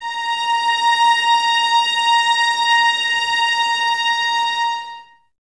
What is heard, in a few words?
violins A#5, string ensembles; Miroslav Vitous; 11 Violins